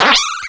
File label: Cry